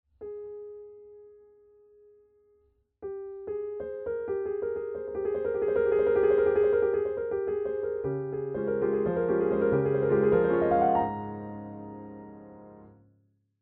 Romantic American D

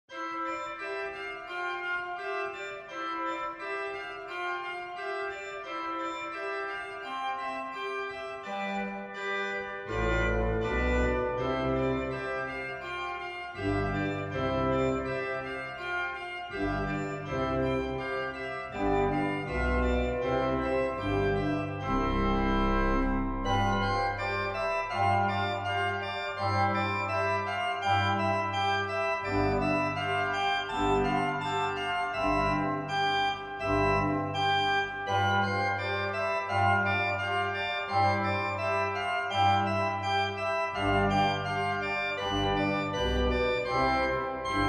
Piano Grade 1 Finger Exercises Ideas

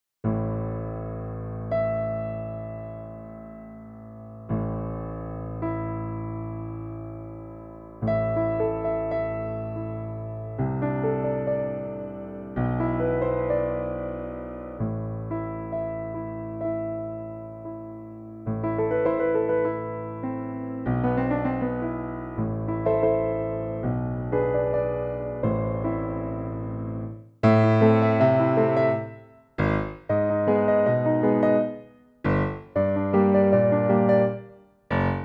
A test of a new preset in Pianoteq 9

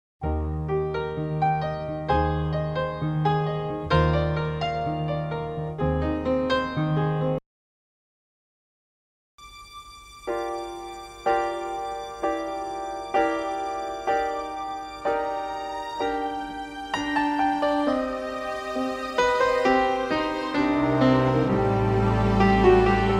intimate pianos

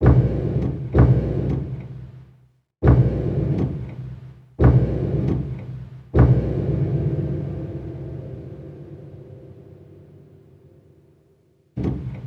Pedal Sound

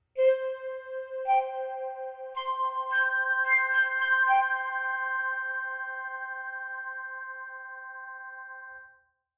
Flute Grotrian FX

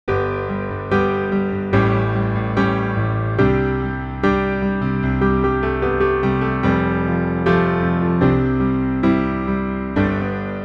Rosey Wood Grand; Orangebush

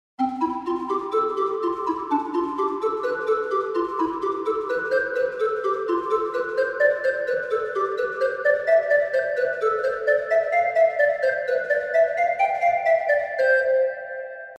dynamic organ Gedact HANON

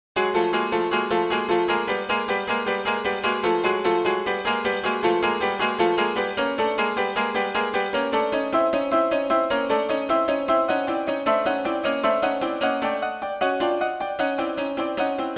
Cimbalom; Perpetuum Mobile; Bartok

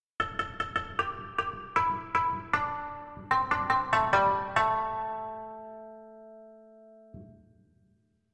shamisen

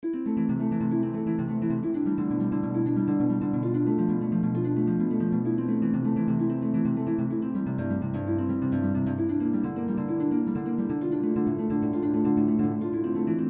Reverse Prelude

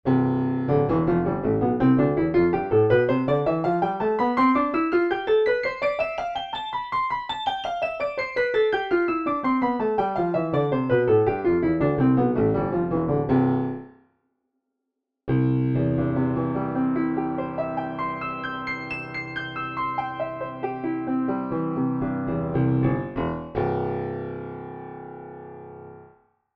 C Major Streicher

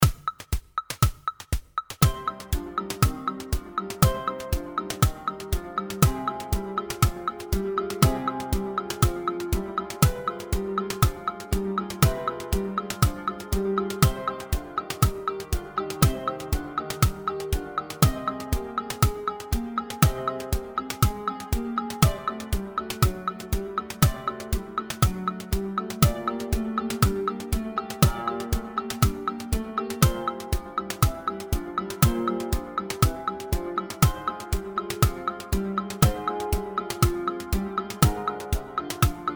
Practising BACH

